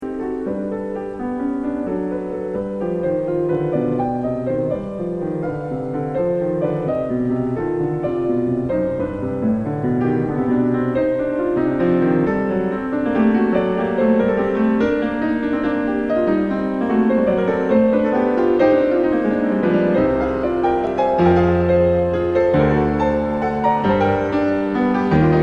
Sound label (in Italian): PIANOFORTE